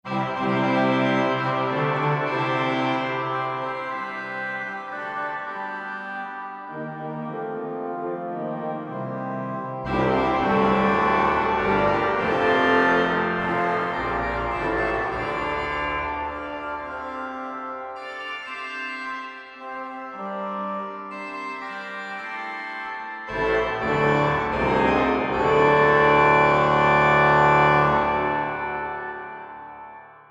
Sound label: Grand jeu, Organteq; Virgile Monin; modartt